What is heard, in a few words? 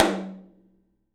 TOM; 3D Sound; 3D Drums